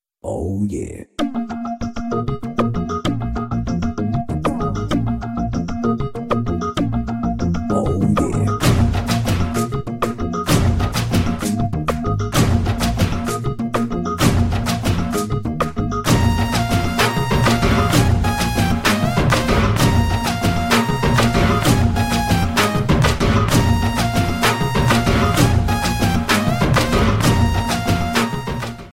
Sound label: music ringtone